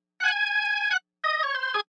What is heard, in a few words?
B3 Leslie Lead Ab, Lounge Reggae; Loopology; Ska Till Ya Drah